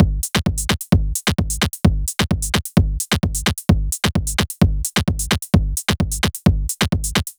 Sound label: Skag Scat, Digital Breaks 130BPM; loops; Loopmasters; Jon Carter & Alex Blanco; Block Party Essentials